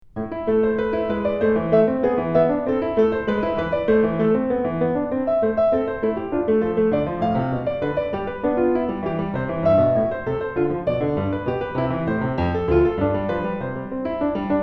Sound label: Invention Piano; Bach; Invention No. 13; BWV 784